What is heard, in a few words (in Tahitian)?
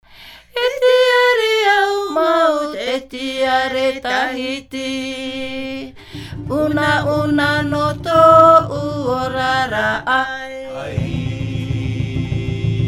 Himene ute, chants polyphoniques maohi; E tiare tae roa i te hopea